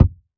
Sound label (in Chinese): 泥坯 踩踏声